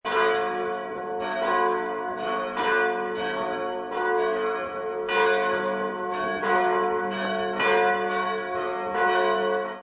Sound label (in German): Glocken; Peterskirche